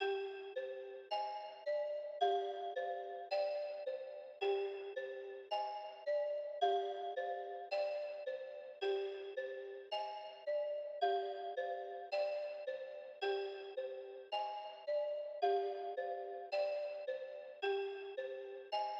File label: 109 BPM C Min Bells; Cymatics; Mummy; PHARAOH